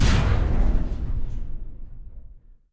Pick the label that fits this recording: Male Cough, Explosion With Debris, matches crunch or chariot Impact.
chariot Impact